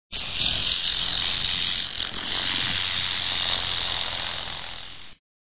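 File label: rattle, animals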